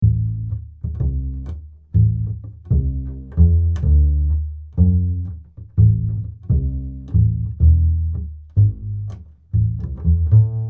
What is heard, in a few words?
avantone ck7 Upright Bass